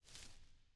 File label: Flower Open; Desert SFX